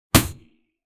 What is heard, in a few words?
zombie bomber slaping its